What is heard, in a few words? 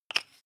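toothpaste close twist hard, Bathroom; haus-garten; badezimmer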